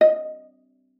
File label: D#5, VIOLA PIZZ; Xsample VOL-5